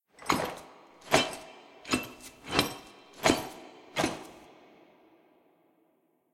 sfx br anim walk lr normal

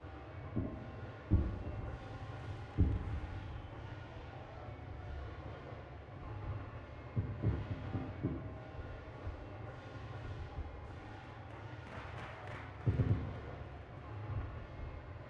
amb market air; tft lny2022

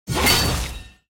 action attack; pantheon

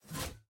ui opponent exit

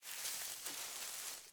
foliage movement

